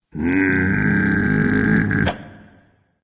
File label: tongue, voice; Smite; ymir skin9